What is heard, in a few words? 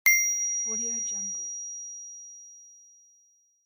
loud ping; interface sounds